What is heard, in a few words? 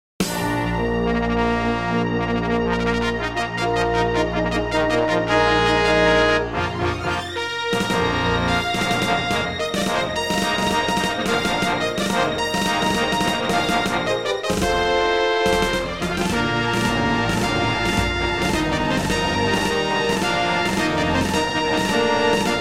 Intro, Yamaha SHS-500; Dark Forces